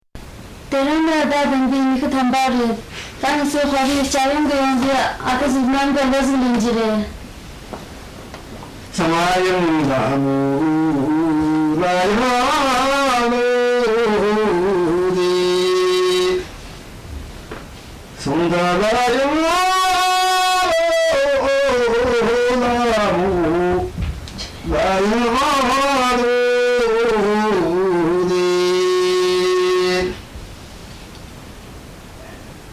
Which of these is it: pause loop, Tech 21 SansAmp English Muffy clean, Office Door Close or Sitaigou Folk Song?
Sitaigou Folk Song